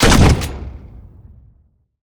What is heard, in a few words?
fire, weapons